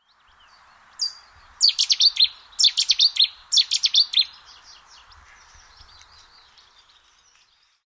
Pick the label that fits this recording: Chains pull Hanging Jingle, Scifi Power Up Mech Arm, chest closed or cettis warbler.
cettis warbler